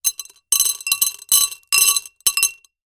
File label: marbles lots drop into glass container; zapsplat